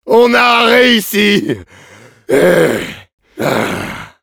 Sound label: cheers, fr; "On a réussi, he…heh…hah!"; Demoman